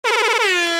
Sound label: airhorn spam